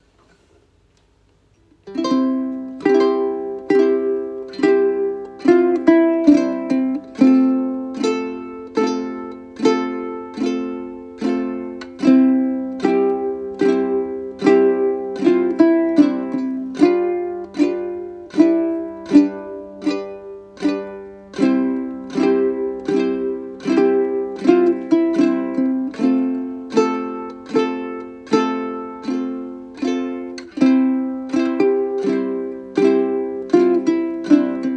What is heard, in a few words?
Uke; Magical blue; Uke book